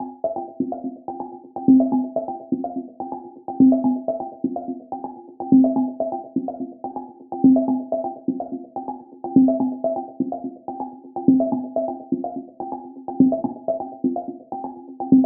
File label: Tonalpercussion Loop 125BPM Fmin